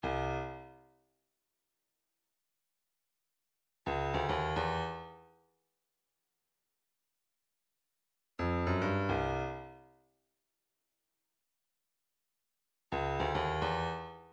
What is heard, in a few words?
106 dumbek, midi